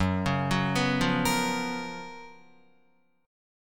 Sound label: Gb Major 11th Guitar; GbM11 arpeggiated; JGuitar